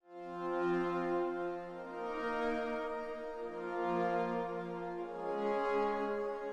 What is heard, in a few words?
pad